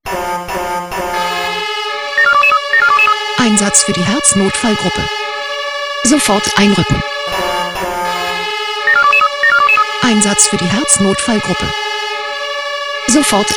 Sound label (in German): SMS Alarmierungstone Herznotfallgruppe